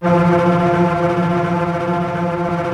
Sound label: TREMOLO F3, TREM STRINGS; Greytsounds VOL-1